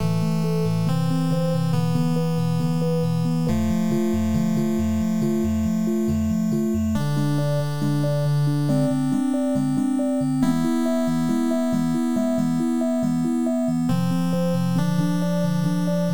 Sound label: 8bit slow